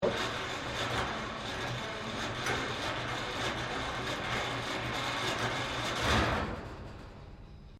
GRINDING STONE SMALL HAND POWERED OPERATING; Various old or antique devices; Machinery (Sound Effects:Machines)

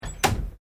Window Sliding Large Aluminium Latch; Household

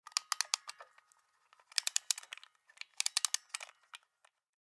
Baby Mobile Wind Up; Wind Up Toys; Blastwave

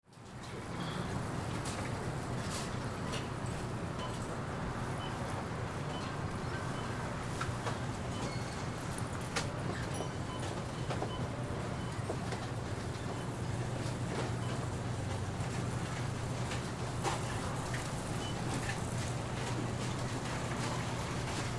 Ambience Grocery Store; Stores And Mall Sound Effects; Stores and Malls (Sound Effects:Backgrounds)

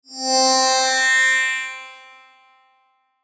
Chime Musical, Chime Musical Multimedia; Synth Multimedia Web Interactive; Chimes; Blastwave; Technology

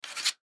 Pool Chalk Cue, Pool Chalk Cue Stick; SPORTS; Blastwave

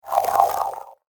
Paper Meets Laser Flyby, whoosh; science fiction sound effect; star wars / trek style games; Andrew Fleming

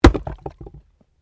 Wood Chopping Axe; Objects

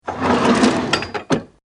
Drawer Kitchen Closed, household; Drawers; Bobby Cole

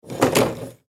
Foosball Table; Foosball Table Spin Player Miss Ball; Sports

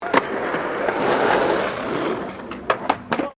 SKATEBOARD SKATE BOWL THROUGH BOWL; Skateboard sounds; Skateboarding (Sound Effects:Sports)

